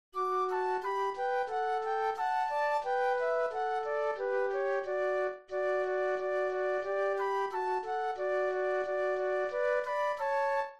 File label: Flute Duet